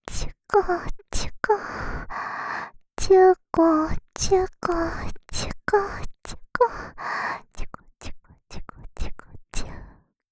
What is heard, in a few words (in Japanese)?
ちゅこ…ちゅこ…♪, ASMR, 左耳